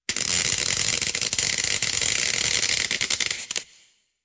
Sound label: Electric Sparks; Halloween Havoc - Sound Effects; Ktel